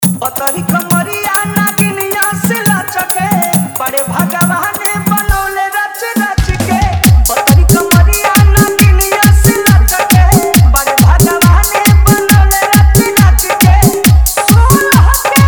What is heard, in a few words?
Hard Dhollki Mix